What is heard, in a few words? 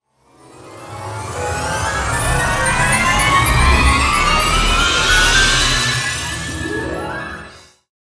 Magical Transportation Sting; pantomime backing tracks